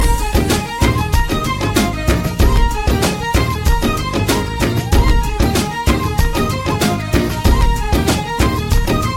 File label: Best Dancin Tone